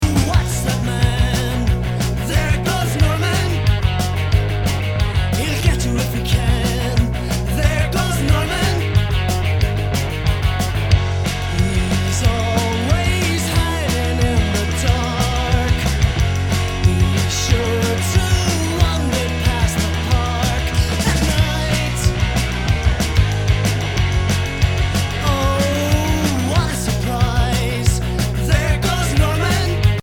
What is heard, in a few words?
undertones